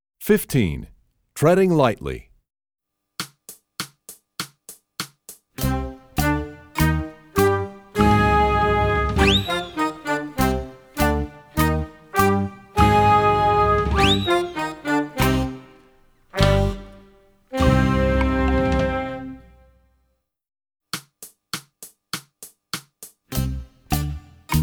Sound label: trombone; essential elements